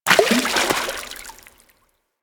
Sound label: Fish Jump Splash C; Eidolon; Warframe; Ambience; Eidolon Plains